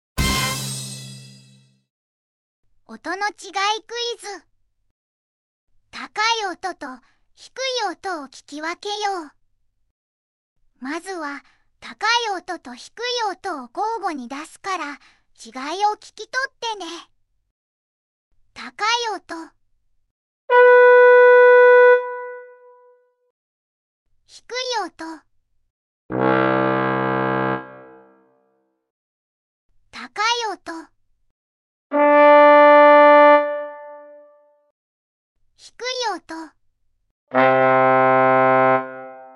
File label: quiz high low